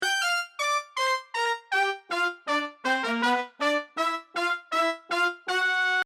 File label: horns